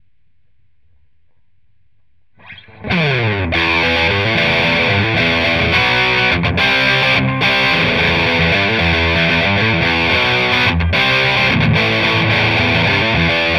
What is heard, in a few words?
Brit 800